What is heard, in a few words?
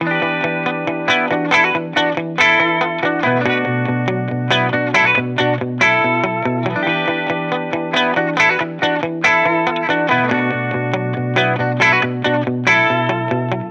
guitar loop 140 Em; Pop; Guitar Stacks; SD PASADENA Pop Sample Pack; Slate